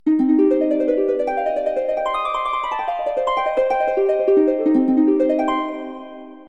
Galaxy S8 harp Bach ringtone; samsung

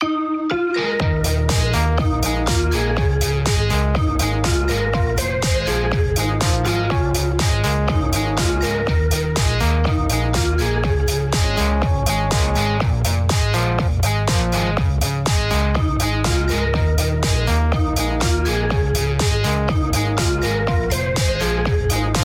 beat style ringtone

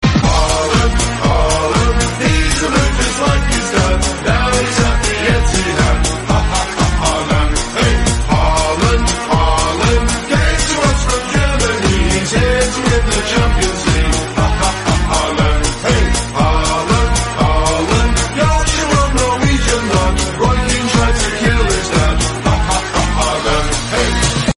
football english song tone; haaland

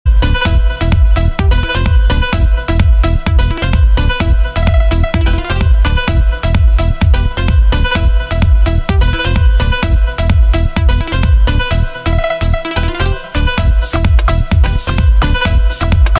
arabic house mix ringtone

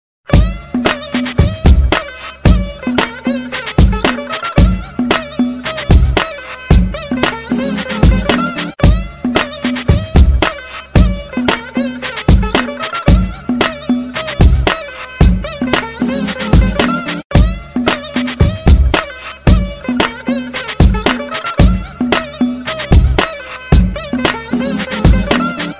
ting ting bass ringtone

